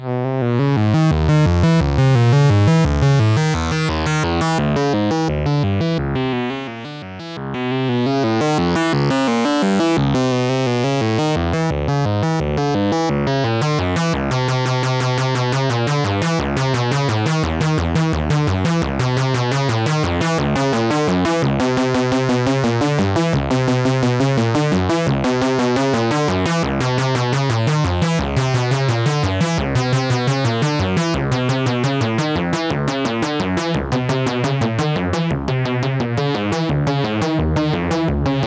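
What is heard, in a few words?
wavefolder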